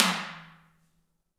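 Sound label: T DRM, talking drum, Heart of Africa; Spectrasonics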